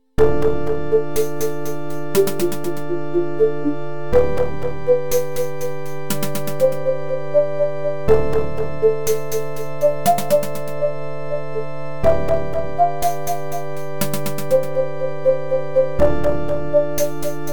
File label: Game Over, DOS; Nicky Boom